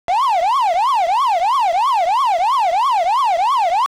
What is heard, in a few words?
Ghost - Normal Move; super pac man